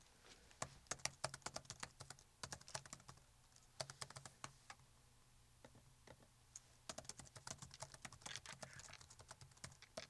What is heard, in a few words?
MD46 Foley